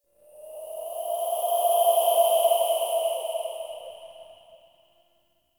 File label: Ext Wind Death Howl; Ambience; Misc Outdoor; Warframe; Infested Eris